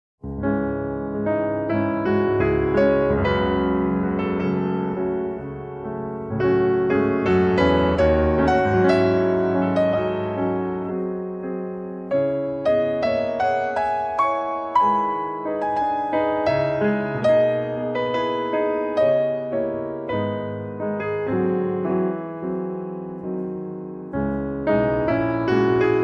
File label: Etude in C-Sharp Minor; 3 Pieces, Op. 2 No. 1